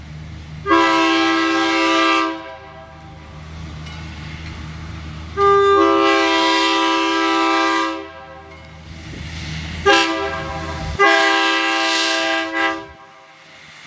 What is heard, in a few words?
Diesel, horns; trains